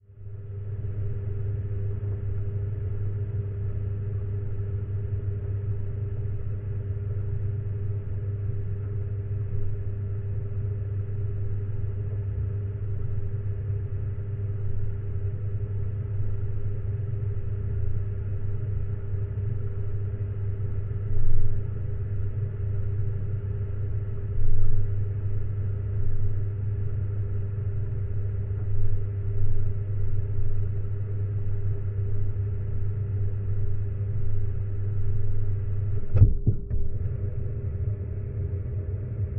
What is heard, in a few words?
Geofon, Rumbling, Dark, Drone; underground sounds